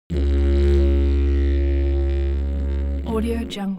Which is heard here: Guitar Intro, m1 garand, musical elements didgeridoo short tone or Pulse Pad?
musical elements didgeridoo short tone